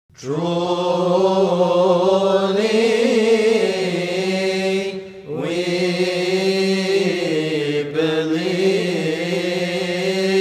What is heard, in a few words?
Truly We Believe Institution Narrative Holy Body